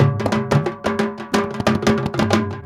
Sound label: INDIA R, Heart of Asia, IND ENS